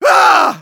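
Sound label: pain severe, German; Medic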